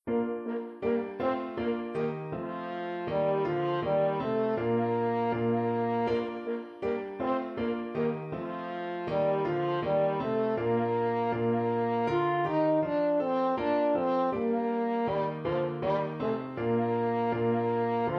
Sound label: french horn